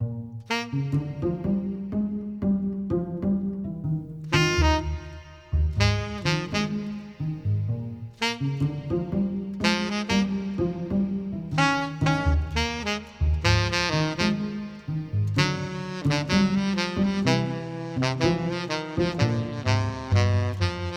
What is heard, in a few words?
Blues